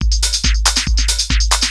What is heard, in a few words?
TECHNO BEATS 140